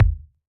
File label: Ball Collision Geo Tackleball Med